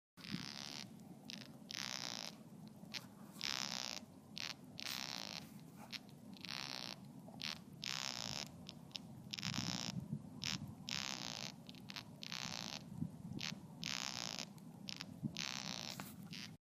Earthworm; کرم خاکی

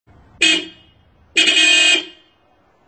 Hella, صدای بوق بنزی; بوق بنزی هلا

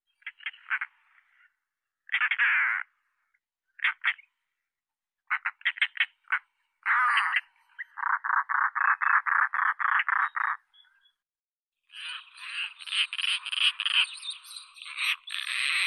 Rollers; سبز قبا

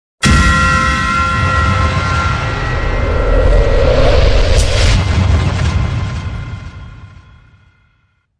Particles

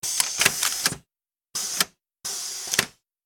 Mechanism Air Hiss

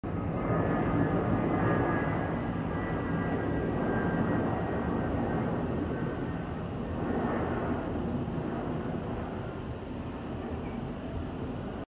Airbus Beluga

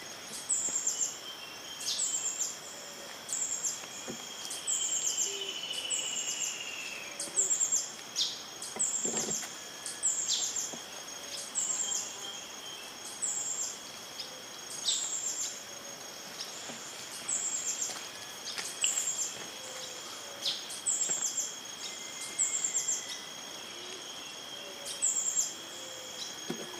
Rufous throated sapphire; پرنده یاقوتی گلوحنایی

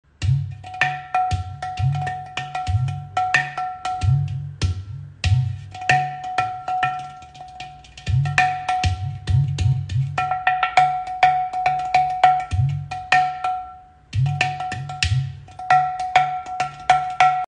kim kim; کوزه

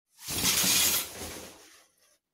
Curtain, کشیدن پرده

